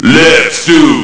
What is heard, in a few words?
classic acid; Amiga Soundtracker Sample Packs